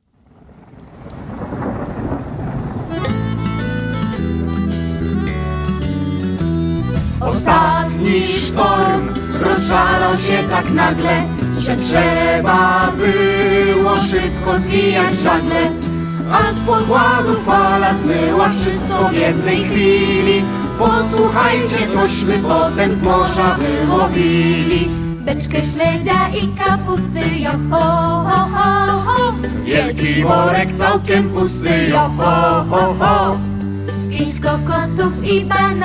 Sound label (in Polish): wokal